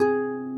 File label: harp